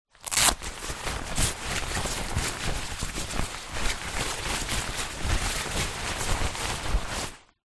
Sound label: foley rain jacket velcro adjuster rip then jacket movement; zapsplat